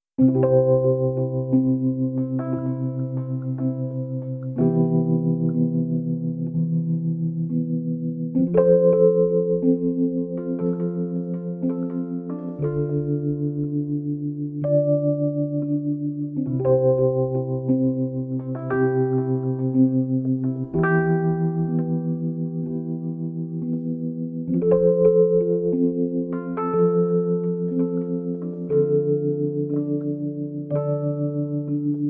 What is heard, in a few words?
Backwater, Electric Piano Duets